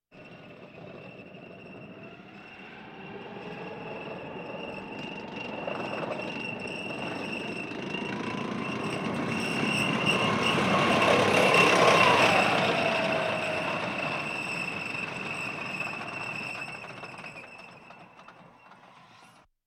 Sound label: Military vehicles